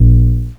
maser

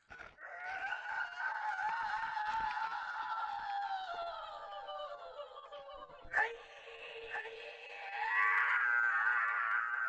hiyaaaaaaaaaaaaah

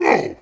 hit; AoM-ARTofMyth

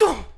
hit; AoM-ARTofMyth

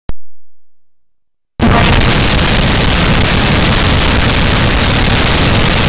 Noise

